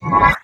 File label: Marker Pickup; moul